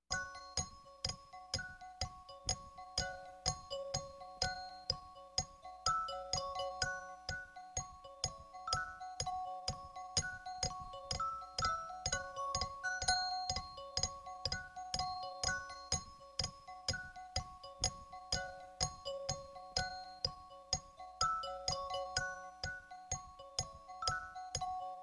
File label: F bells Collage in 4